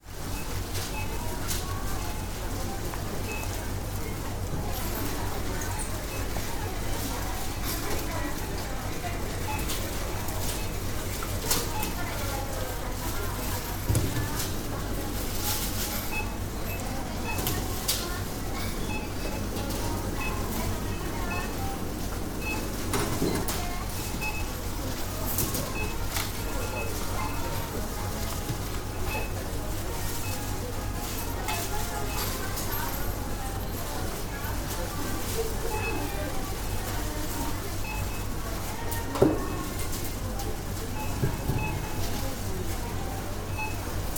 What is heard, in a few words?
supermarket